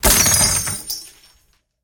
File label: Window Crash; Game rip; MiSide